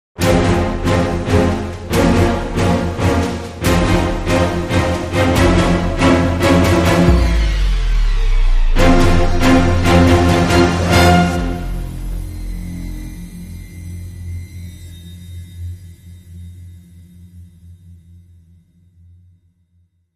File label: Dramatic Sting